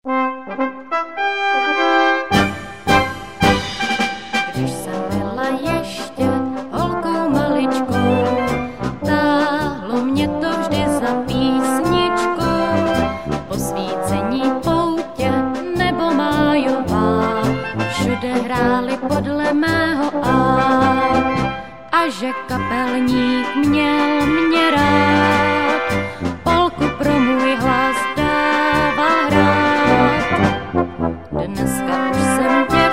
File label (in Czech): polka